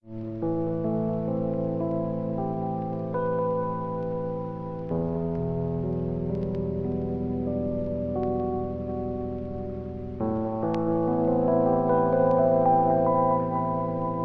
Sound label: flute and keys, Loops